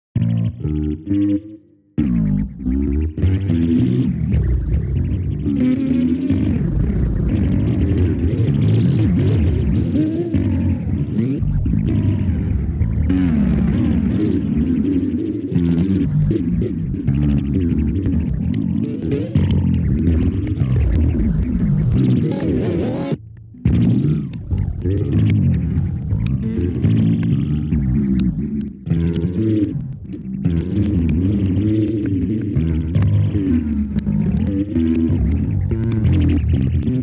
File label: Stem Bass